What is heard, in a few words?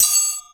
Twang, Percs